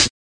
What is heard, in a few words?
hat; Hats